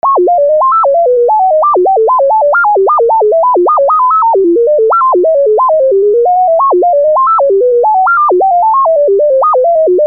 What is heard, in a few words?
MUSICAL